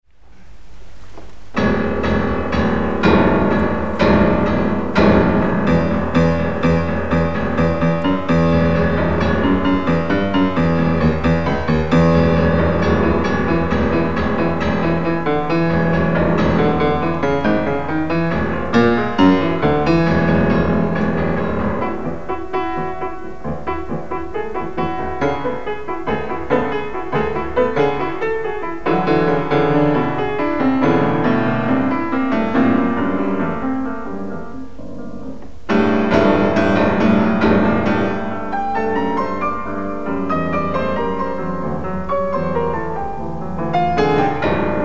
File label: drums pipes; bartok out of doors